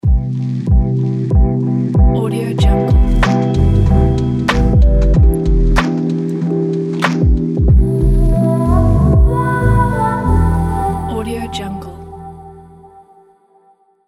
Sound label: lazy afternoon logo